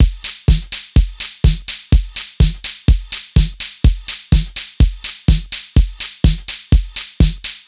Full Loop 125, Loungin House; Equipped Music

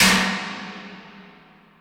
CYBER KIT, DRUMS

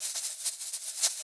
EQ Perc, Percussion Mix

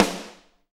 SD Real, Roland; Sound & Vision - Gigapack I

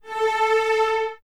Cellos A 4; Miroslav Vitous; String Ensembles; LINKS ORCH

